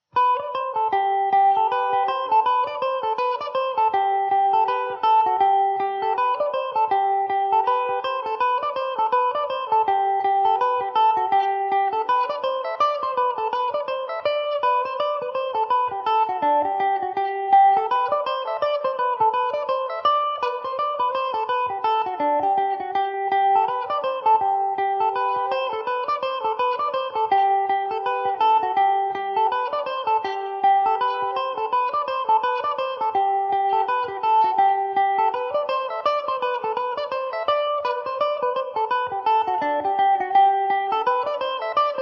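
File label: G major mandobird, irish mandolin; The Kesh Hill; Epiphone Mandobird VIII electric mandolin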